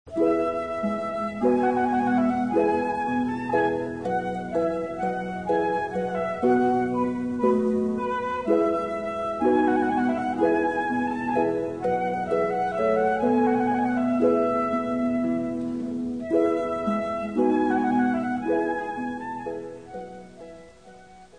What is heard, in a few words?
trumpet voluntary harp flute